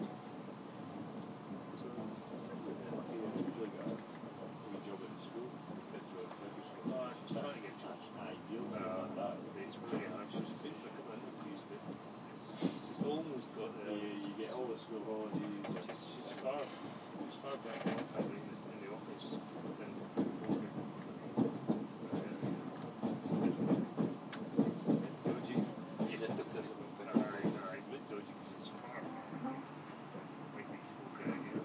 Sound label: train gets up to speed and insanely squeaky door noise to finish